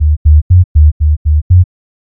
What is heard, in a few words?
Bass Loop